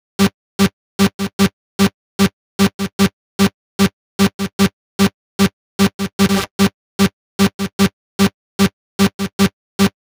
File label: Tune Up G; Vengeance Samples